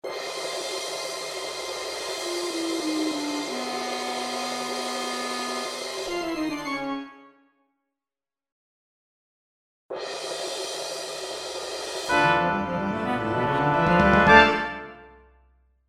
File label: Orch